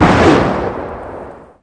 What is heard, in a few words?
rocket; panzer